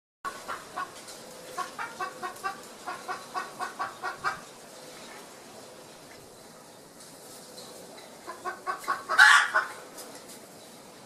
Canto do faisao de edwards

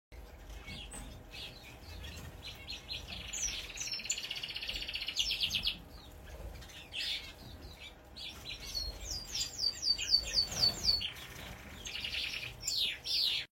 canto do mandarim palido

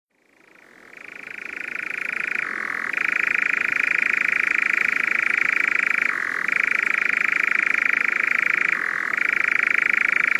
canto do noitebo cinzento

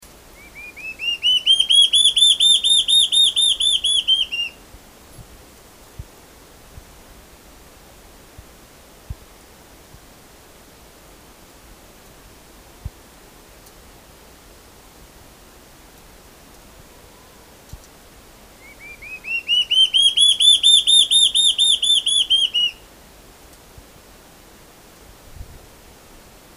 canto da borralhara assobiadora

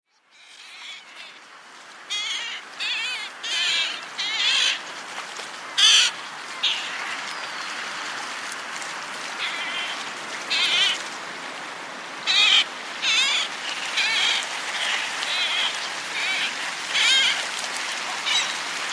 canto da gaivota inca

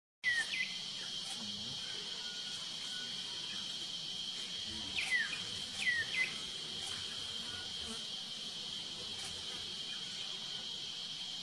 Canto do cuco esmeraldino

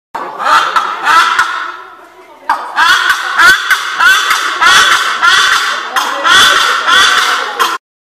canto da abetarda

